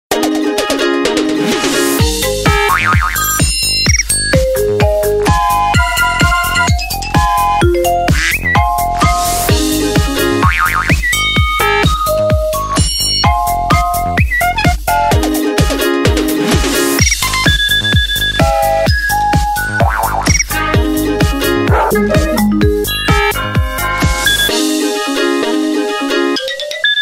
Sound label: iPhone 14 Pro Max Remix, ringtones